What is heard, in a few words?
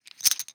lose, video poker